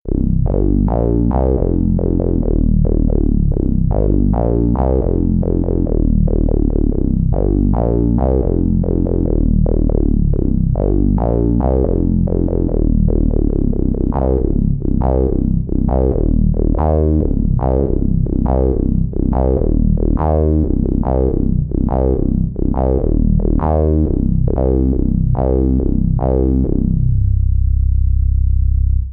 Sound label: BOSS VF-1 SYNTH, bass